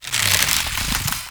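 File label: attack big bow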